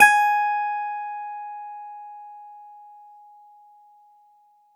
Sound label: Clavi, Digital Keys; Nucleus Soundlab; MK Digital Keys; MKClavi S